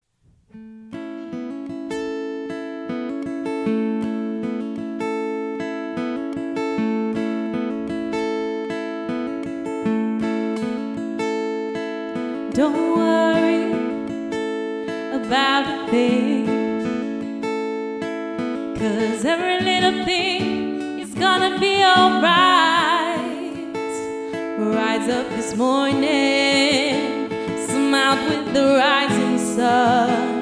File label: Acoustic